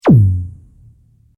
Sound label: Sweep Organic Fast